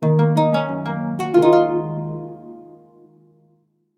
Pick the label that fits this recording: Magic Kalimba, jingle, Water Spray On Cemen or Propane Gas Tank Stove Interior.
jingle